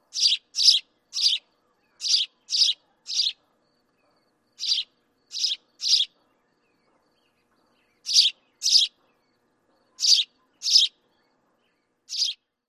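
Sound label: House Sparrow, song number 2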